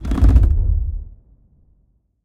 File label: nudge step; rise of maya